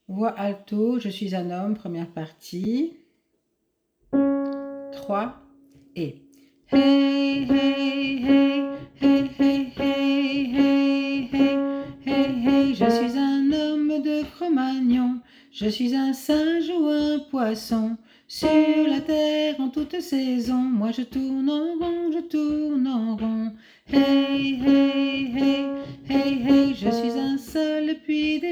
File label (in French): voix alto